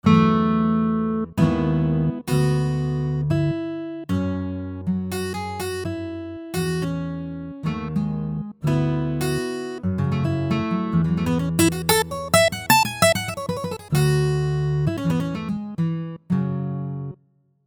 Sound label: Solo Fingerstyle